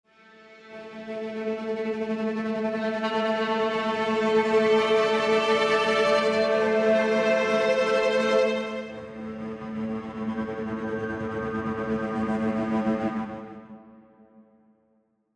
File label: Hybrid Sustains